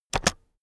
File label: keyboard external space press fast heavy